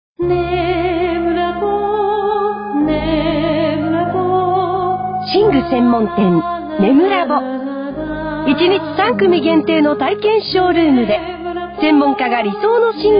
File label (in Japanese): komoriuta